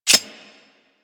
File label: Heavy Shotgun Reload; Weapons; Warframe; Tenno